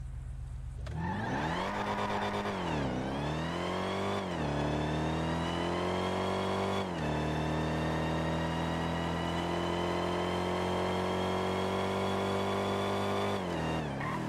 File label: muscle v8, Vehicle Sounds; engine sounds; polgauntlet,gauntlet4,buffalo4; FiveM